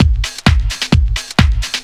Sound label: drum 130 dance, drumloops